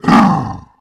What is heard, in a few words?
Monster Orc Attack